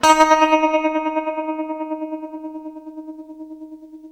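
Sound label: tremolo D#3, guitar; East West; Steve Stevens Guitar Samples Collection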